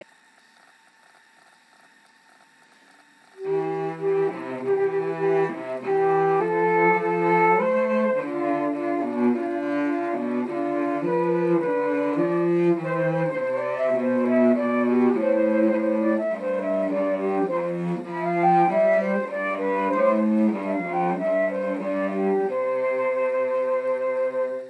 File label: Flute Cello DUO; Sheep May Safely Graze